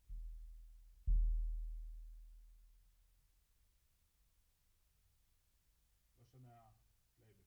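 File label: Bass Drum Out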